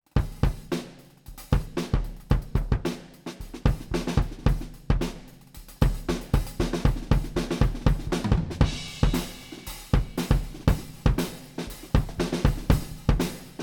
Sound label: Kick, Head On 20cm U47 FET Outside 30cm